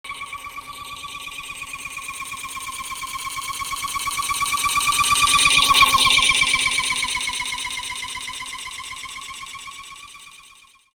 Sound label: BOOMERANG, Anime Sound FX FLYOBJECTS; AKAI Anime Sound FX